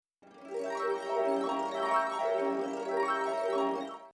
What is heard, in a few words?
harp gliss